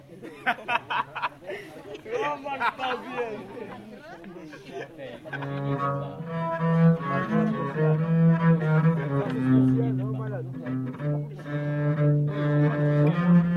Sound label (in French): Interlude viole de gambe